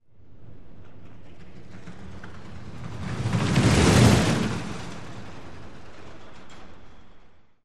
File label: Roller Coaster Quick